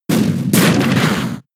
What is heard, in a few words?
Rock Blast 1 hit; Pokemon; gen 6 attack moves; XY ORAS